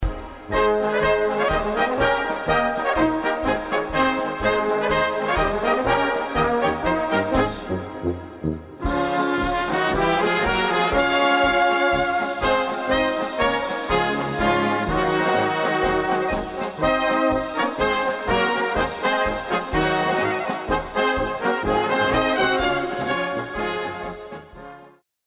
Polka

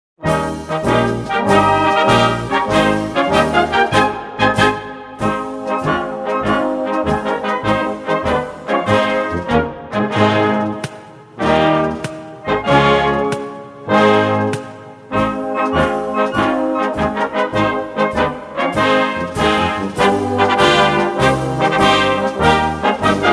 Marsch

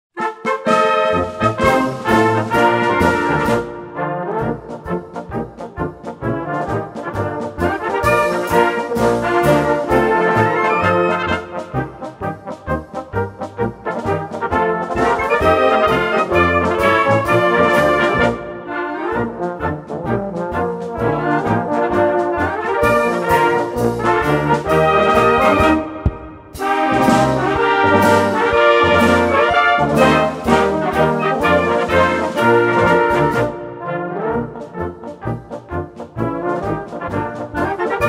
Marsch

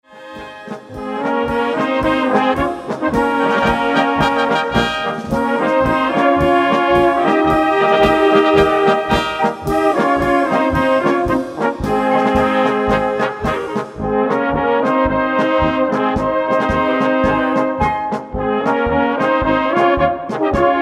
Polka

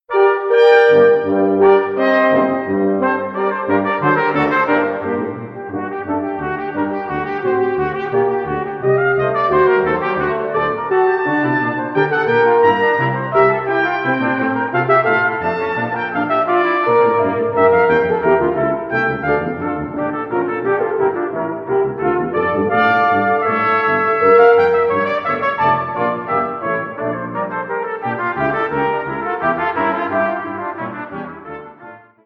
Violinkonzert in Moll

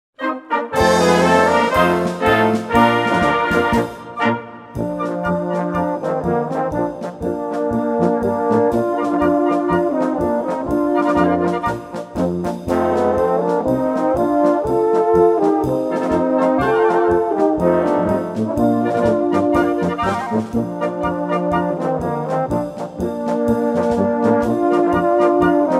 Polka

